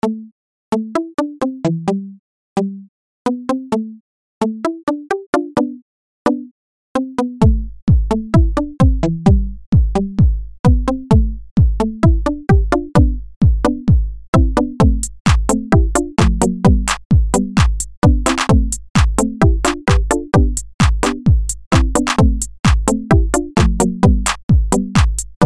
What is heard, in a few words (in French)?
larmes reggae